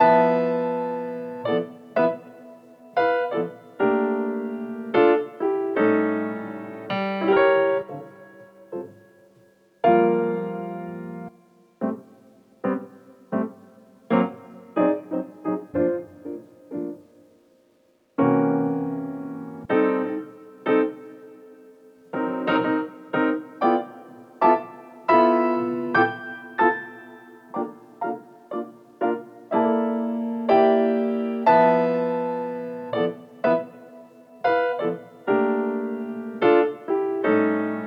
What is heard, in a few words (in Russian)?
Fortepiannaya Muzyka Retro